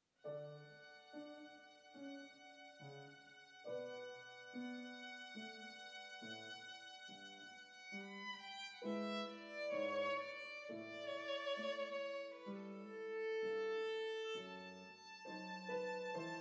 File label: bach air, violine und klavier